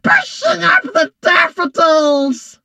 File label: die voice; cordelius; 卡通工作室